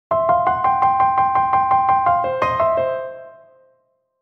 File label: blues lick